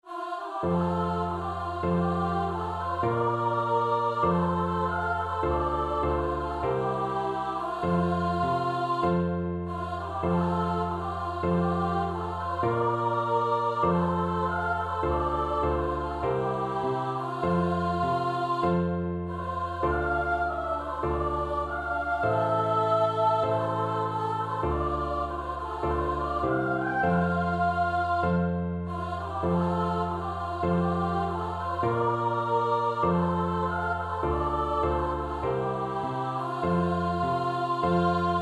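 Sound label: sally gardens, soprano alto; vocal duet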